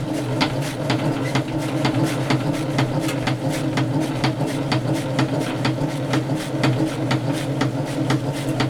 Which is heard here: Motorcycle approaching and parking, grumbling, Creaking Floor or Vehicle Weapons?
Vehicle Weapons